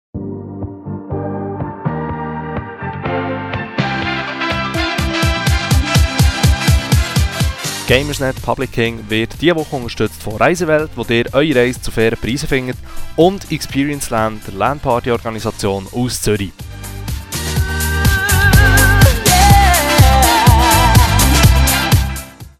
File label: Jingle